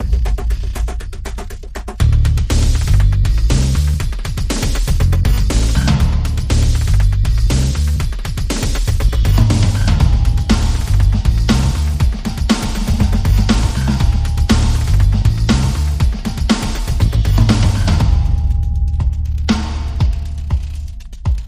Bass Perc and Pulses Only

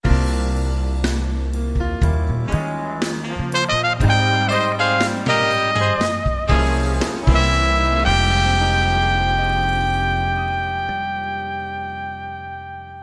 Romantic Brass, Jingle

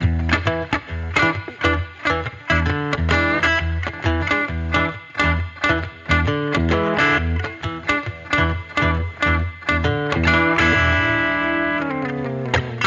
Electric Redneck Guitar Only

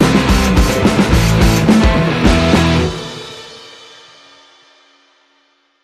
Bumper

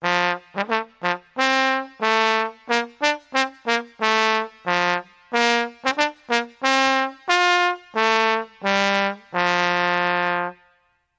Trombone Nautical Bridge

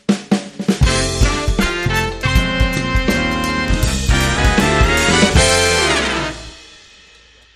Funk, Sting

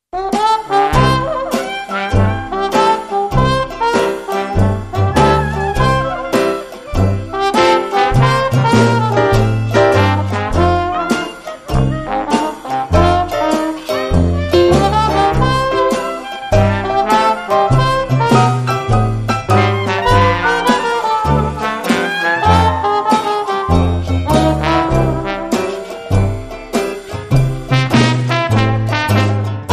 Hawaiian Blues

